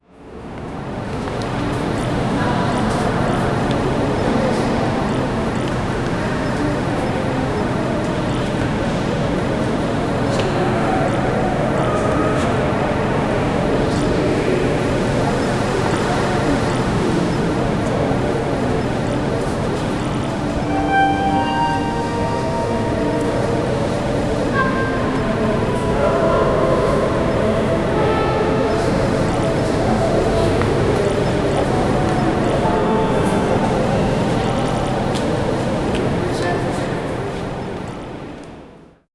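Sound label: suspense music for a pers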